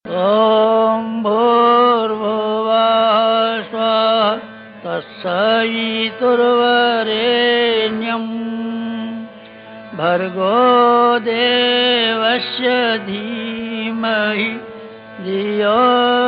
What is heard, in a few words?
gayatri mantra, caller tune